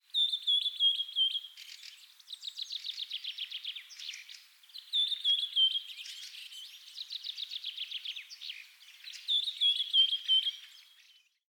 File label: birds chirping